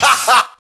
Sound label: ha ha; Voice; ooh ahh